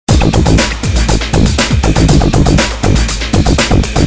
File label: Loop